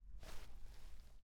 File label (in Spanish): Ruido Cama